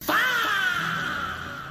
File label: fahhhhh